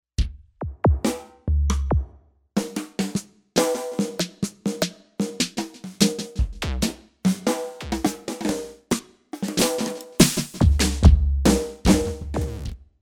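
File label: Drum ALL BD SNR, synth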